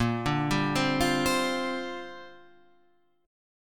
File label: A sharp 6th Add 9th, Guitar Half Step Down